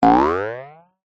cartoon boing ascend jaw harp; zapsplat

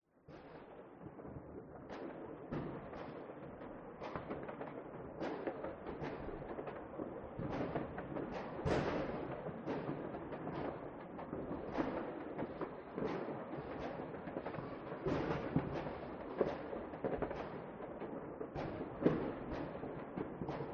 New Year Fireworks Pack; west wolf

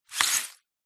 household magazine glossy slide wood

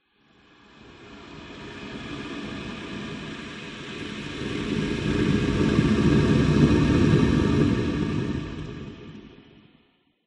horror scary breathy growl designed

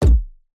multimedia game designed impact heavy thud

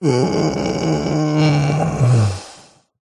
human male light moan groan quiet ill weak pain; zapsplat

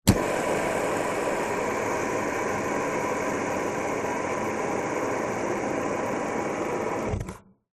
leisure fidget spinner on table spin

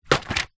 foley notepad small drop floor

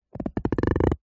rubber short rub creak; foley; zapsplat

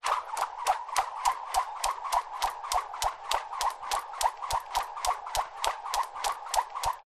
sport gym skipping rope

